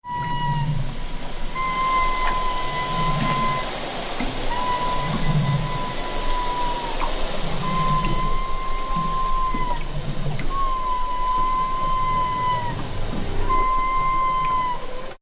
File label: humpback bubblenet feeding, baleen whales